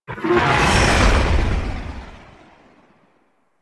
Fly By Quick; Blue Sky Liset; Vehicles Flight

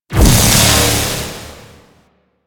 Betrayal Beam; Enemies; DE; Warframe; Warframes; Pax Duviricus

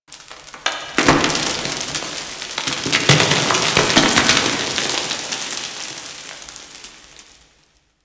Cave Rock Slide

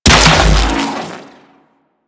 shock rifle fire; Warframe; Weapons; Corpus

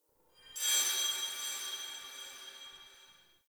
Hub Texture D, Ambience, Dungeons, Under City; Silent Rose

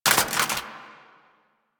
AK47 Reload Mag Drop; Warframe; Tenno; Weapons

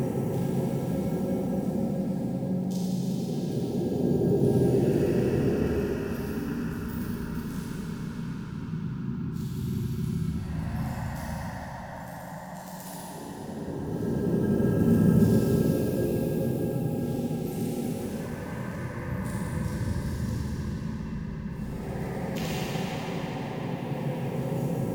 Under City Ritual Room Ambience Loop, Dungeons; Beds

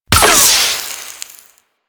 Heavy Shotgun Projectile Fizzle; Weapons; Warframe; Tenno

